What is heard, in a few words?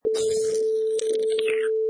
Slurp